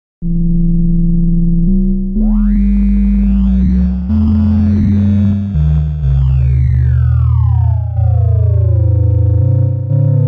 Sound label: Klavis Module Ring Mod Modulated By Osc2